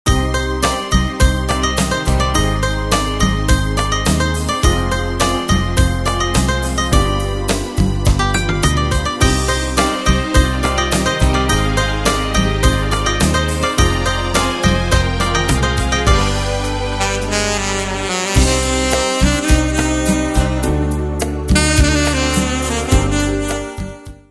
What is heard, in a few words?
sax